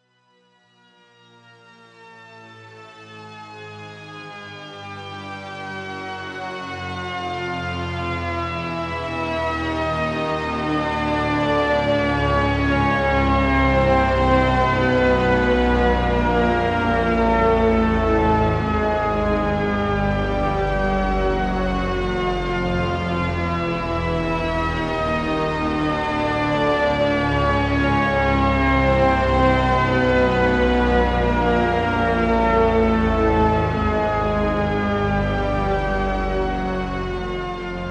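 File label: Symphobia Mixed Orchestra Descending Shepard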